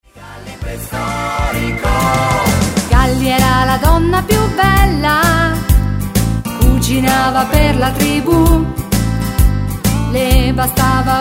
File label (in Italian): hully gully